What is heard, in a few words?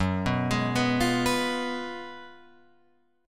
Gb Major 7th Suspended 2nd Suspended 4th Gb down Guitar Open F; GbM7sus2sus4 arpeggiated; JGuitar